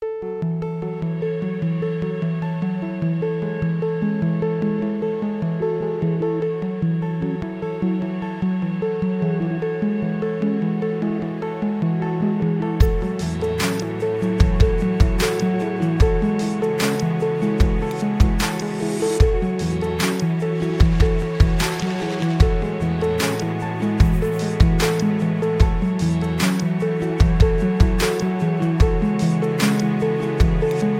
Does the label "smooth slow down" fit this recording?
Yes